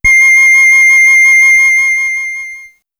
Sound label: Casio VL1 Fantasy; Solo-Synths; Giga Samples Collection